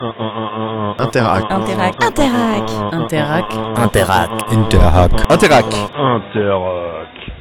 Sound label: Jingle; interhack